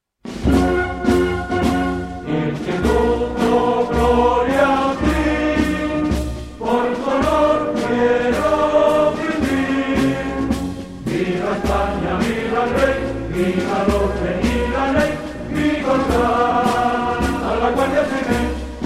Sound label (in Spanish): himno